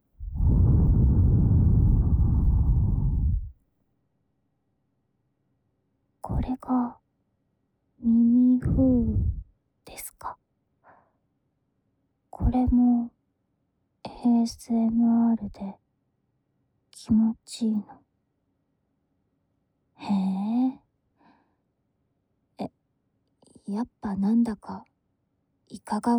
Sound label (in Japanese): クール耳かき（右）